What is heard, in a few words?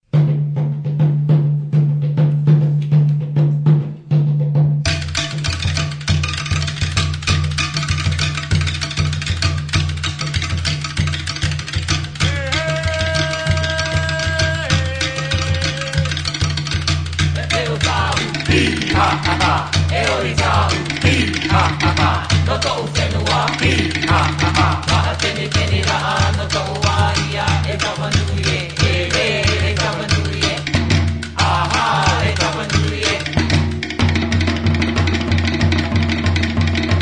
Luau Drum Beats